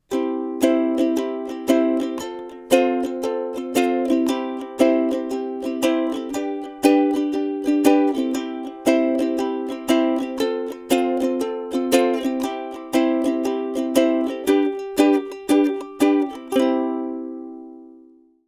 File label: ukulele